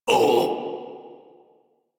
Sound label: Pain Lich D; Kuva Lich